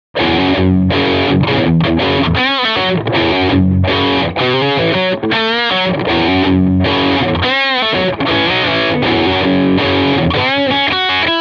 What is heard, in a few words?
Overdrive